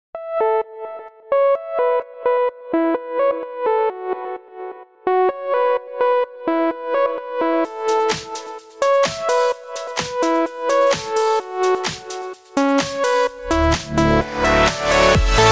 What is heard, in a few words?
Happy-cute melodic EDM song idea